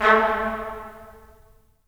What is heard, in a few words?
BRS, Tps Stacc; Roland - LA Composer vol. 4